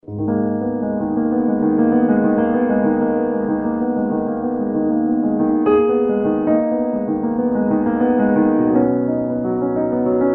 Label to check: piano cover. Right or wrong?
Right